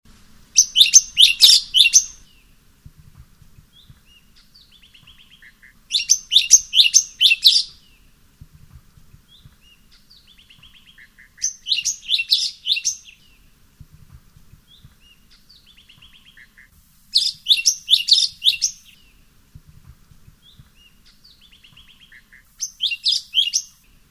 suara kicauan cucak cungkok

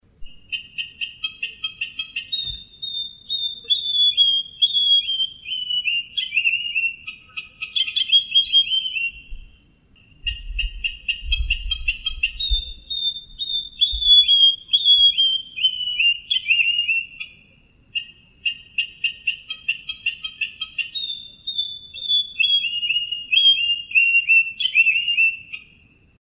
suara brinji emas buru

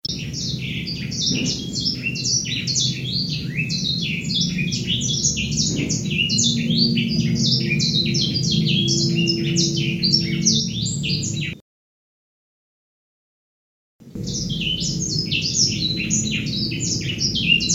edel sanger lagu asli

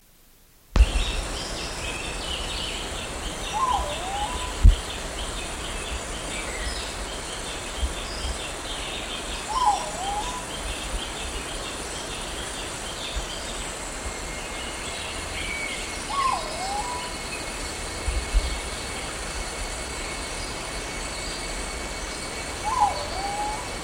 burung madi hijau perut biru

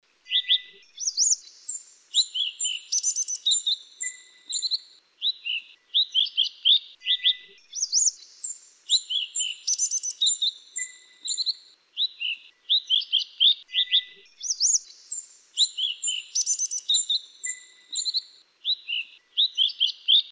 suara sepah dagukelabu

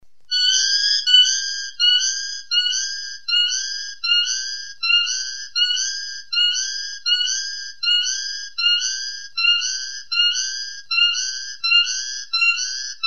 jalak suren tonggeret jangkrik

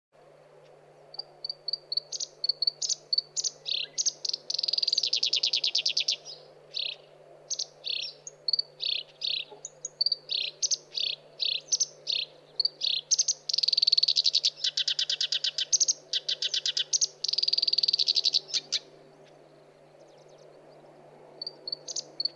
suara kerakbasi alis hitam masteran

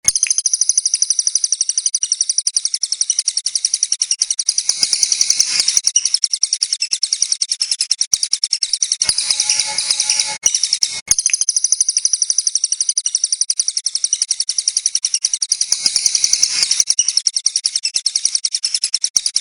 suara burung cililin shakira versi panjang diulang 4 kali

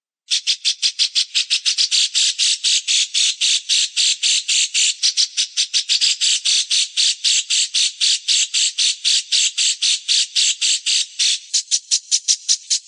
suara burung cucak jenggot

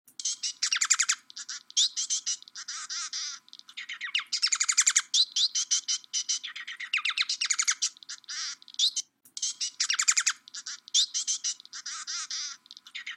emprit jepang

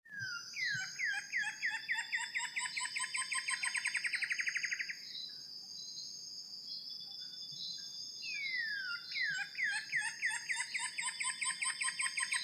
suara cekakak pita biasa

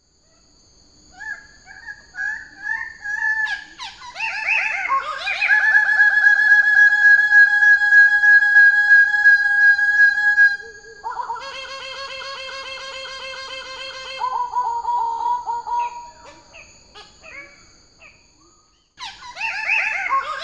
cenderawasih goldie

